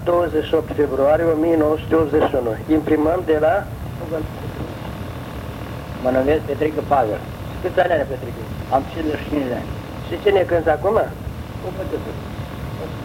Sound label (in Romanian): Batuta, joc popular vasluian; Centrul Judetean pentru Conservarea si Promovarea Culturii Traditionale Vaslui